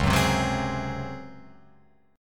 Db Major 7th Suspended 4th Db, Guitar Open F, chord; strummed; JGuitar